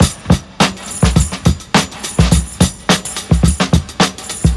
105 bpm breakbeat e key

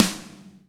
loud acoustic snare f sharp key

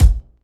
focused steel kick drum A key; kick drum samples

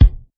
smooth kick drum sound b key

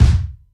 bass drum F# key; kick drum samples; one shots

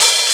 dry open high hat sound a key

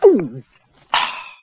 gulp and sigh

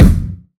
wet kick drum single hit D key; Duration 0:01

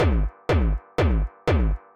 house bright laser kick 122bpm; drum loops

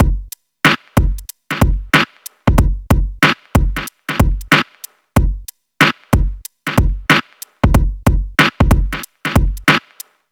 93 bpm breakbeat sample f sharp key

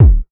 clean bass drum one shot E key; Duration 0:01; Mono; kick drum samples

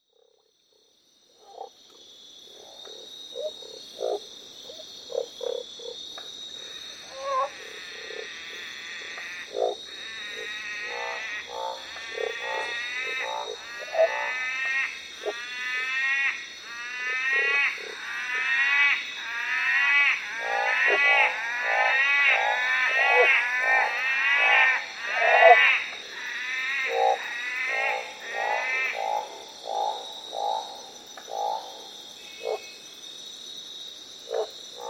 Barred Frogs - Orange thighed treefrogs Croaks; animals

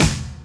crunchy acoustic snare e key; snare drum samples

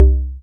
deep tom single hit c key

